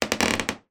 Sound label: wood creak floorboard; foley